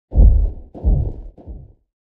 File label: Sandscrit Walk to Idle; payi